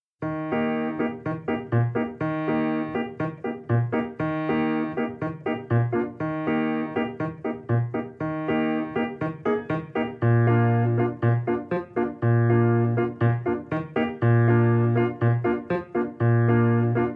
bossa nova

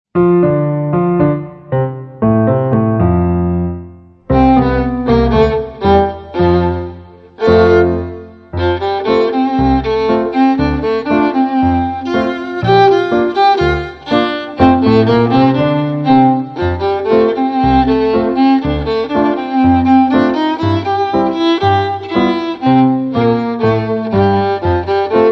Chicken Rag

